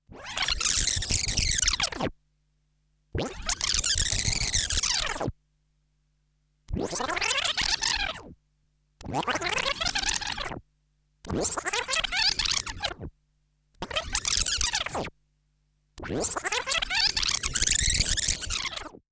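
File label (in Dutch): tapedeck achterwaarts versneld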